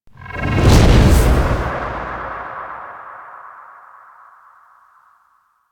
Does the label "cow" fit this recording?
No